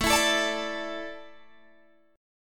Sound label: A Suspended 4th, Guitar Open G